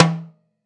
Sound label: Snare Off, Live Trip Hop Drums; Drum Samples; Smoke Signal